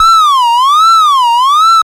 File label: HOUSE ALARM; Masterbits - Tekkno Trax; MACHINES+FX